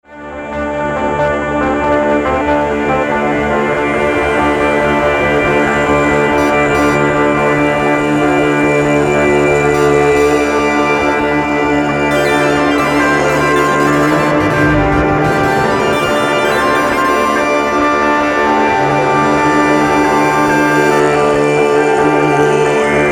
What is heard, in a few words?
Theremin; atelier Theremin; Moehnesee